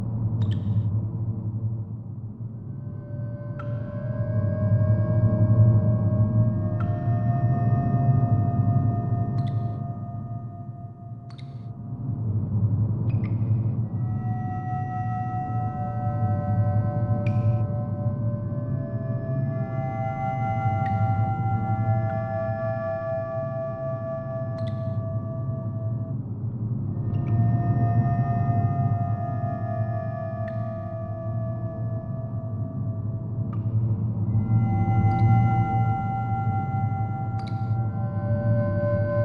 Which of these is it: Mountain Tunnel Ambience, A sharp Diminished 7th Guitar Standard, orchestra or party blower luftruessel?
Mountain Tunnel Ambience